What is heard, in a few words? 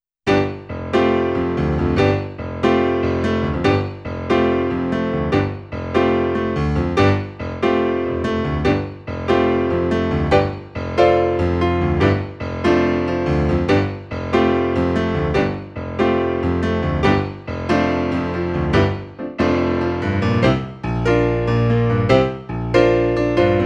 accompaniment